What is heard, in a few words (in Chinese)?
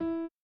院长房间 钢琴; 序章